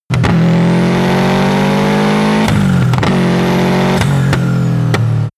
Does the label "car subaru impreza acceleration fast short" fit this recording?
Yes